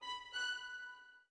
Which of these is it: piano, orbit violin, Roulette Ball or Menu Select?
orbit violin